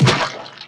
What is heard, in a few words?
Hungry Goblin Attack, game; Eft